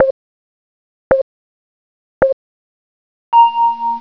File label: beep; wipeout